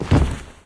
foot step; smite; zhongkui

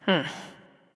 NV Thinking; smite; awilix

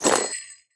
armor heavy; smite; chiron

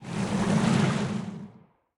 glide; smite; poseidon

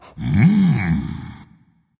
content dragon; fafnir

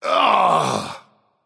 ugh; smite; olorun

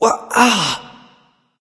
confused; smite; voicepack; xbalanque

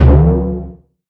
Step; Smite; god Kumbhakarna

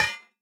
minion battle foley metal hit; smite; npc; roman archer

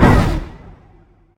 horse breath; smite; guanyu

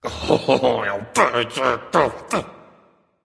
grumbling; smite; voicepack; ullr

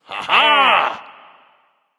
yay; smite; agni

